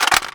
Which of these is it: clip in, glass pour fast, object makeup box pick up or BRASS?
clip in